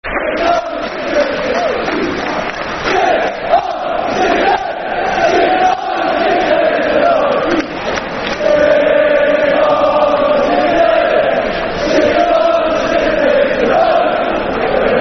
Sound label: Shit On The City, Football Chant (Soccer Songs); Aston Villa Avfc